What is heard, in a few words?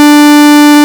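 Ob Saw Square, Synths; Multisamples; Ableton Live 12 Lite; Core Library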